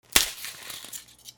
BREAKING

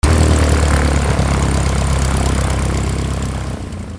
flight

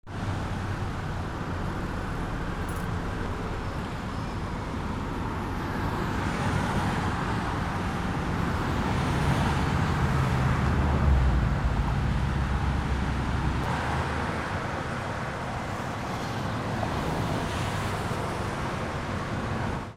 AMBIENCE

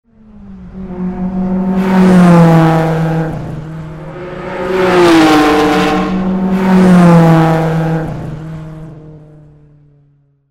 CARS

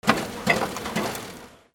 INDUSTRY

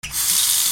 BATHROOM

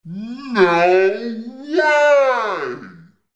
CARTOON

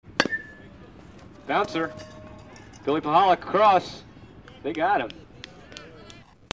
GAMES

